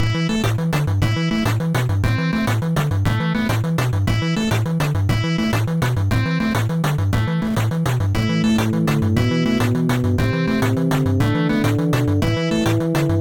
Classic Boss Battle; Duck Simulator 2

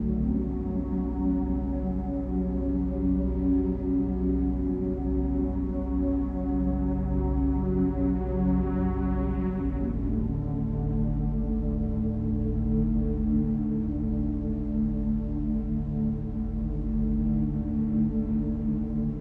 brunchcanyon treebuild loop 100bpm CMajorMinor

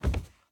Hiding Spot Enter Body; The Inn

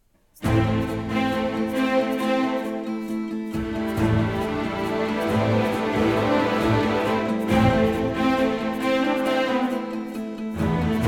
Music Battle

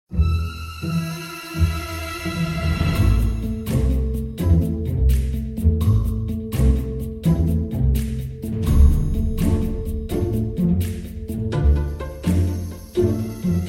End of Game Suspense; Ticket to Ride

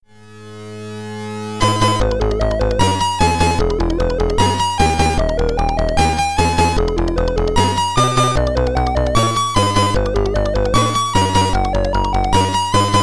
Bonus Round, SID Stereo; Cosmic Storm; Commodore 64

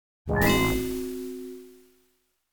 Kablam

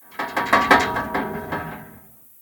Metal Bars Random; The Town

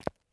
FOOTSTEP Run Trainers Asphalt; mono

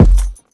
Footsteps Wood Run; The Town Sam; Devour 2021 gamerip pc macos

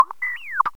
cutise

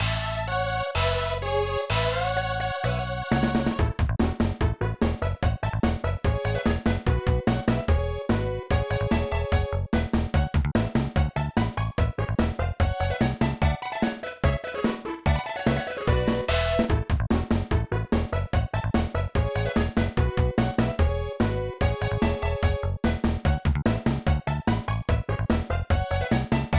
Title Music; Ranma 1/2 Ougi Jaanken; snes

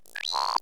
Creatuer

